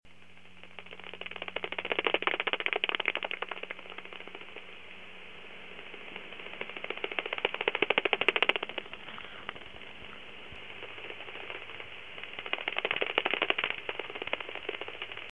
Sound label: pip pip